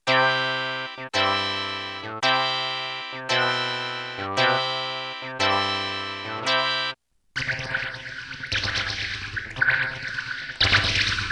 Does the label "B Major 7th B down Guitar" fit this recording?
No